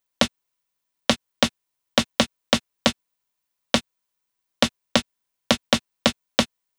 Snare Loop, Drums, Dance Beats

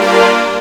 STRING; SoundFactory

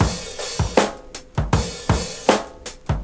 Old School Break, Drums

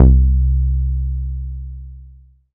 fade bass; SoundFactory

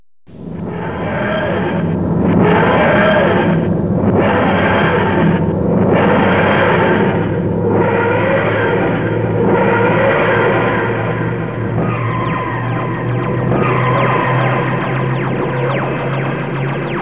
Takeoff, TARDIS; Blitz3D

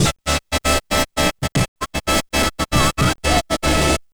Turntables

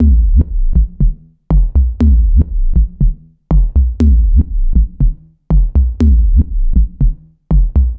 Kick Loop, Drums, Dance Beats

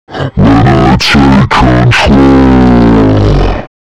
voc; pitchshifter PSI

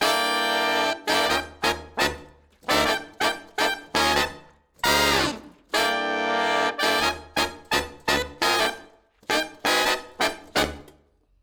Horns Section

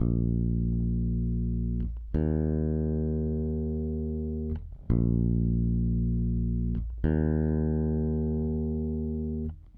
Electric Bass; Basses

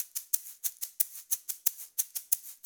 Acoustic Percussion

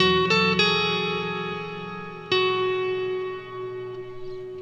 Electric Guitar, Loops; Guitars

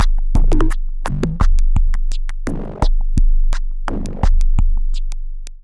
Electronica Drumloop; Drums

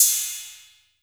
Cymbal One Shot; Drums

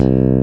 C1 FE BASS; roland1

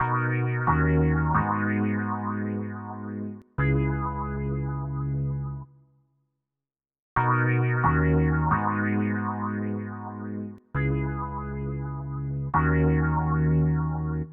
Electric Piano, Loops, Keys

